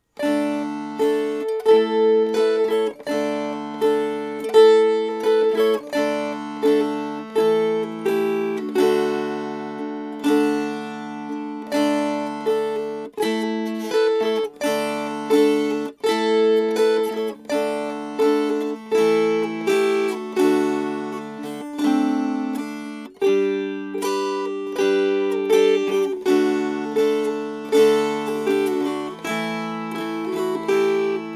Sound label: Winster Processional Strummed